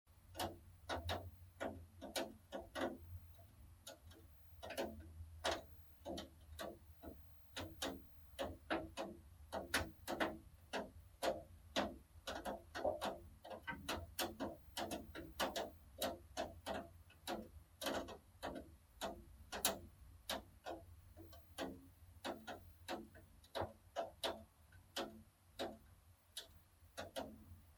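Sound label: drops on the windowsill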